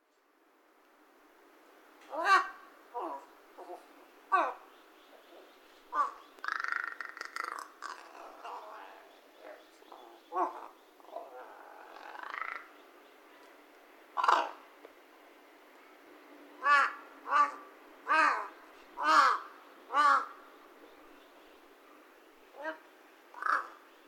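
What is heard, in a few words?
hashibutogarasu, Large-billed Crow; saitama; koe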